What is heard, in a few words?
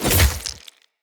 Short Sword Stealth Attack Stab; Weapons; Finishers